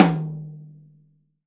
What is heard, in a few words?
POP HH TOM; VELO TOM 1